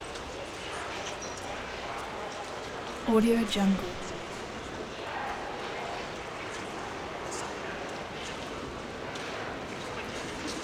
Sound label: hallway walla big reverberant room movement; prosoundfx